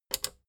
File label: plastic button small latch click disable fast